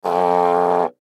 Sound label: Baritone Low Note; Cartoon; Musical